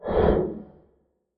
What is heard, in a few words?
light airy whoosh; soundscrate